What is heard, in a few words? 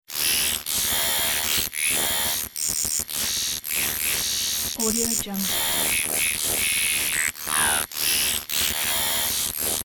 droid talk